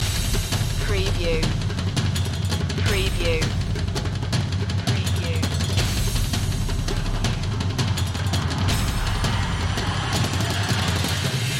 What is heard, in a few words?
percussion loop